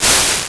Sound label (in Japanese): suna